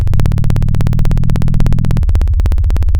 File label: Sound of a plane taking off